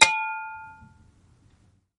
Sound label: bell